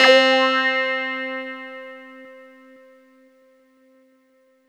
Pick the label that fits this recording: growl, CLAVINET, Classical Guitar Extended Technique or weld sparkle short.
CLAVINET